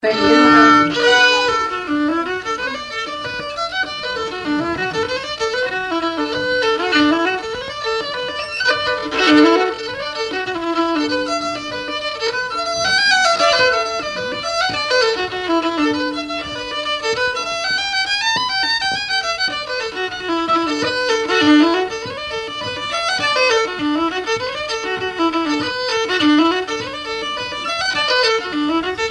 pitched up, Sliabh Luachra reels; glenside cottage lighthouse; The pitch has been altered to aid learners; on the original recording, Jack is tuned down a step and a half below concert pitch!

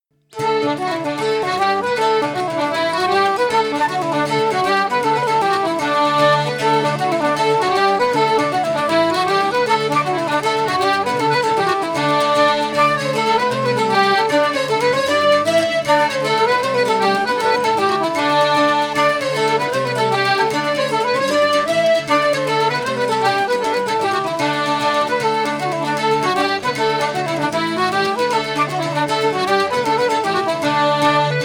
Sliabh Luachra slides; katie scollards monks

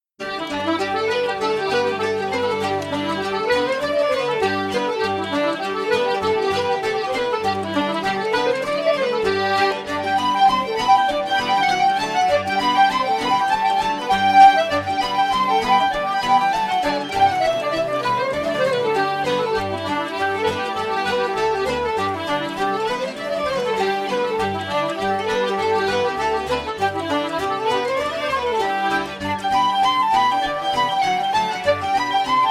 quirky, Sliabh Luachra hornpipes; monks

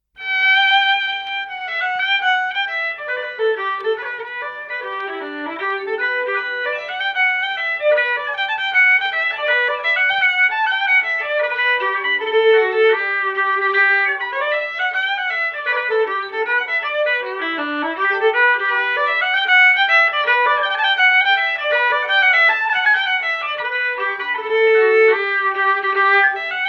pitched up, Sliabh Luachra hornpipes; ocallaghans high

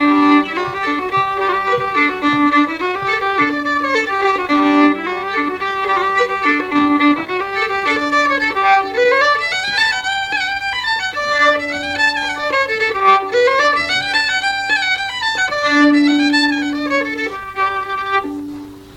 pitched up, Sliabh Luachra reels; the scolding wife denis

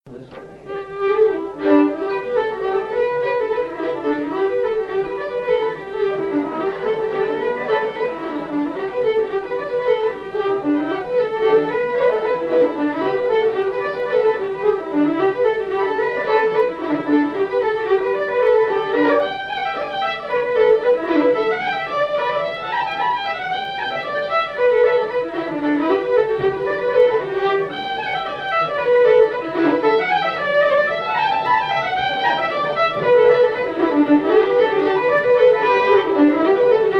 pitched up, Sliabh Luachra reels; flower of the flock; A particular Pádraig O’Keeffe setting of a widely-popular reel by the same name.; Denis and Pádraig play it. (pitch adjusted to aid learners)

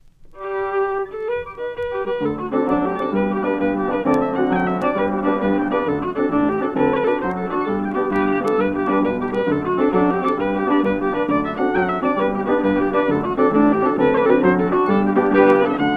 pitched up, Sliabh Luachra jigs; coppers and brass aka humours of ennistymon paddy cronin

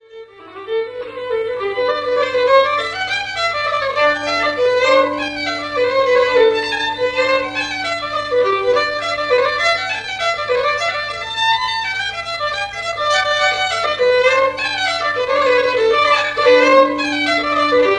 pitched up, Sliabh Luachra reels; the lazy dog; Denis plays his reel

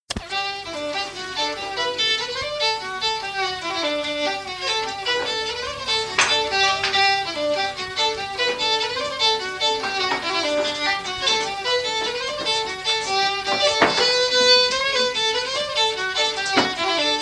fiddle; maurice okeeffes; ballydesmond feile cheoil 1976; world fiddle day scartaglin

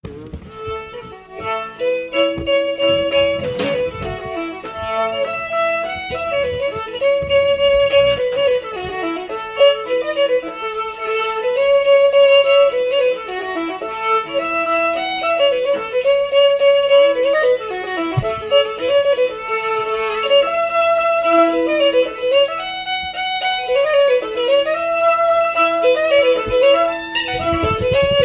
pitched up, Sliabh Luachra hornpipes; princess denis

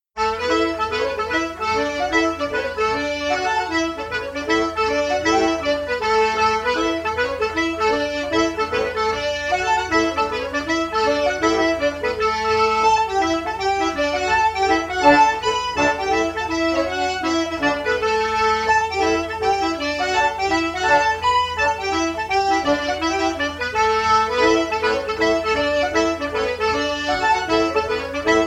pitched, Sliabh Luachra slides; katie scollards cuz

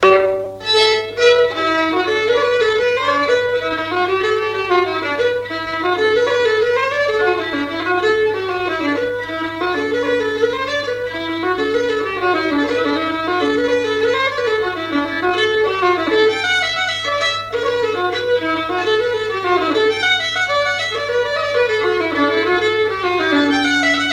munster storyteller pitched up, jigs; Top 40 of Sliabh Luachra project

